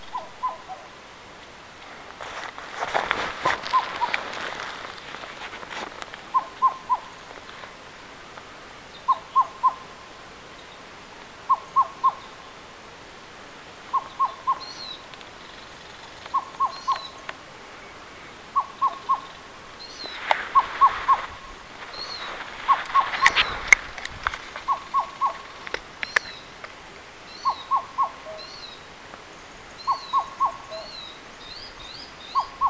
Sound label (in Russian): madagaskarskaya kukushka cuculus rochii, zvuki kukushki